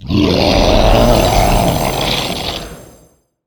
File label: golem; monster; boss